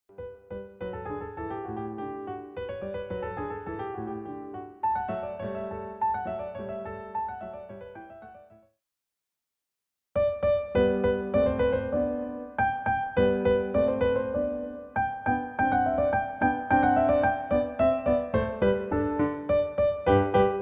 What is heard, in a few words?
finale scherzando; Camidge, Matthew